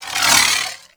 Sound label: Proj Whiz; Warframe; Sentients; Dolicholyst